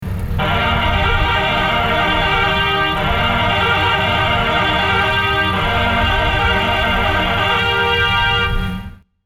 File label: Ready Fanfare